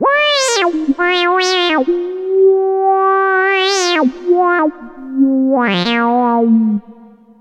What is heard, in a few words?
screaming mini